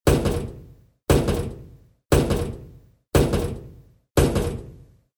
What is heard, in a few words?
Door All